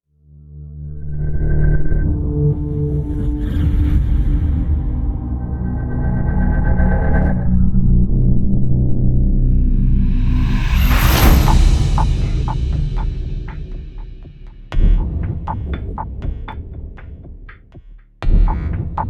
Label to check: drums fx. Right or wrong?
Right